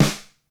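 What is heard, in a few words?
Snare Drum Acoustic Big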